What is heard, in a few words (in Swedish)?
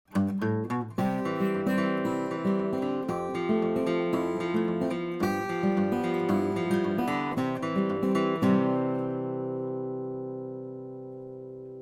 akustisk MS